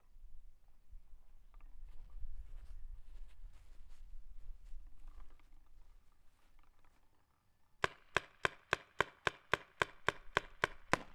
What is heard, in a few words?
spyder longbarrel back, guns; spyder se longbarrel back no clip